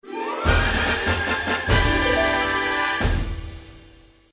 Victory Music; android; The Saga of Conquest

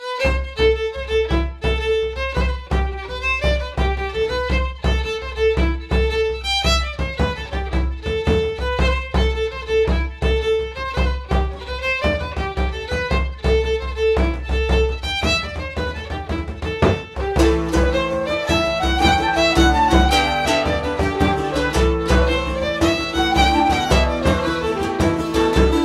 Orchestra Ambience; Assassin's Creed III

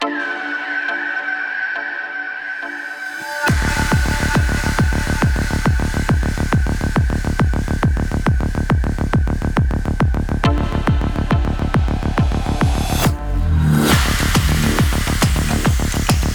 RED ALERT; Subnautica

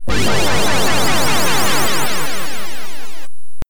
SFX Insert Credit; N.A.R.C.; arcade

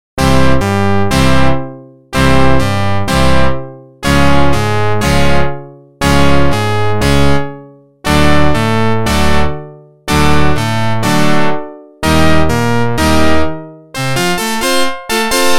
Organ; NHL 94

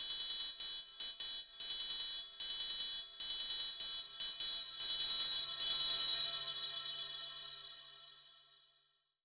Triangle; legend of zelda the links awakening 2019 switch

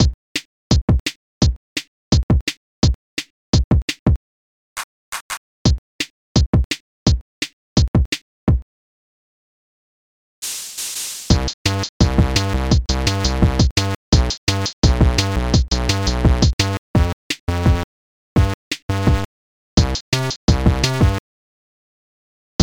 Video Game Stage Karaoke; pinky street kira kira music night pop town gamerip

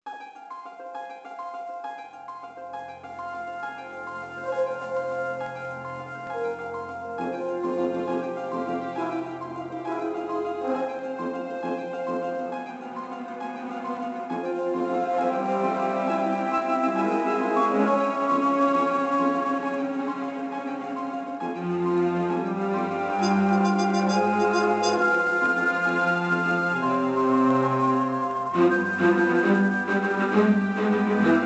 Music Castle Exterior; monster lab; nds

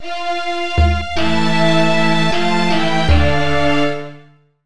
Level Complete Lose

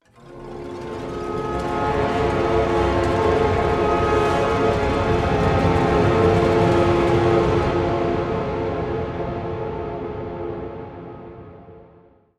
String; How to Survive Third Person Standalone

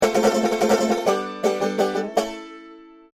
Positive Pos stab banjo; Outdoors Old

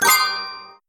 Gem Points Fill

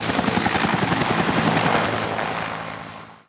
TAKE OFF

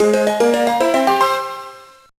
1-Up; santa claus junior gba gamerip 2002

